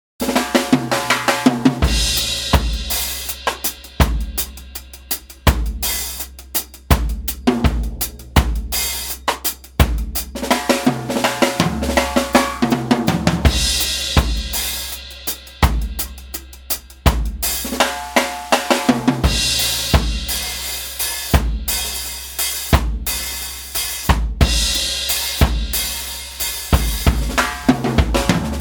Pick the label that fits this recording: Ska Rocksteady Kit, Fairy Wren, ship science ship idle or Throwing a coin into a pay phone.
Ska Rocksteady Kit